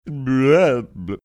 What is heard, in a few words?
making funny sound